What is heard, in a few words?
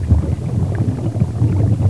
Lava Loop; Playable Tutorial Level; TR1